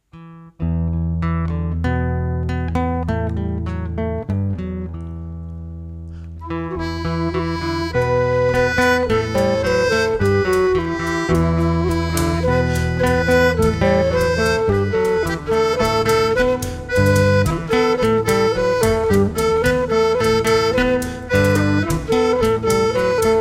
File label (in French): gavotte